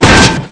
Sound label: metal; panzer